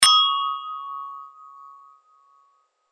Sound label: drinks clink glasses